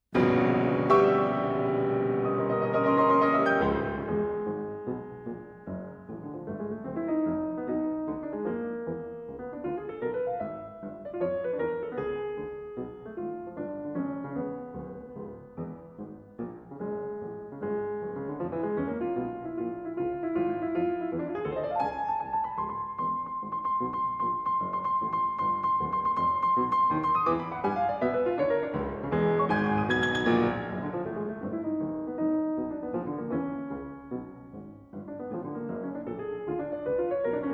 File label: Basso Ostinato; Shchedrin